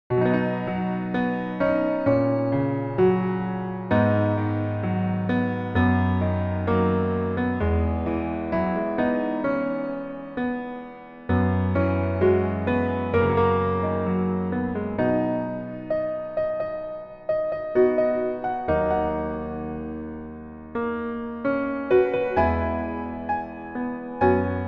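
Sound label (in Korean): Piano version 멜로디MR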